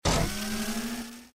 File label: robot out; jetpackLearningPlatform; crack-the-code